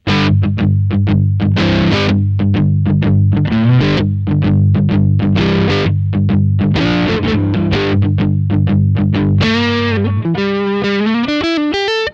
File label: typical telecaster; dsl401